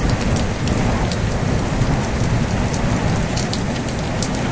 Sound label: Rainy Ambience Loop